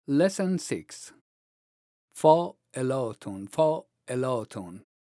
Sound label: vocalization, Daf